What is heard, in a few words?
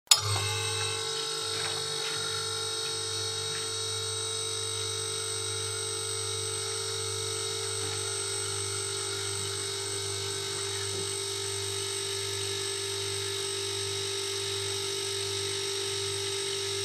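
vkl rabota holod, fridge switch on and running; шум работающего холодильника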